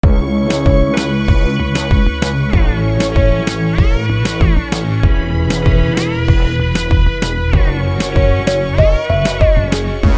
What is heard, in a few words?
instrumental